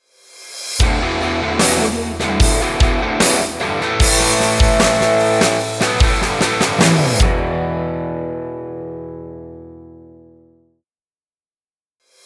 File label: hard rock ident; studioetude